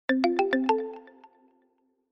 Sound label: Inquiry